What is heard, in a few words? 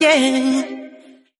yeah, sung